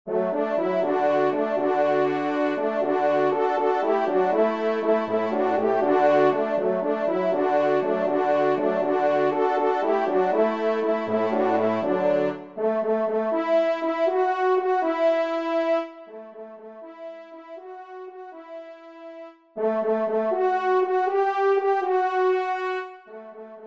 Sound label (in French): trompe; Rolle; Retour de Chasse; Emile Rolle